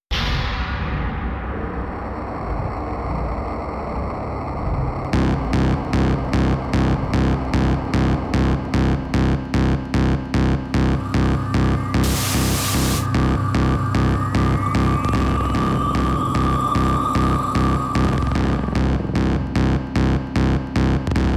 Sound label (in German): Elektronische Tanzmusik